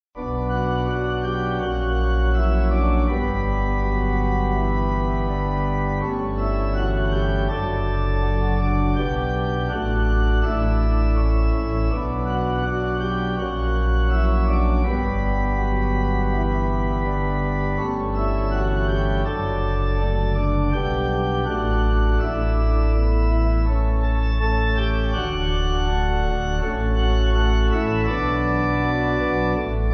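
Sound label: Pipe, Pipe Organ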